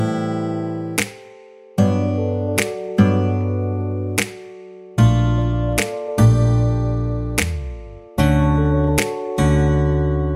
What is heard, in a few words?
Instrumental